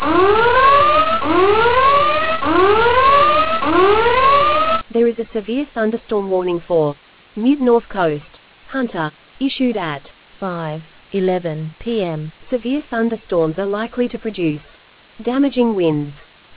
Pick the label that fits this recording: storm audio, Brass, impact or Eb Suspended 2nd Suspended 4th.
storm audio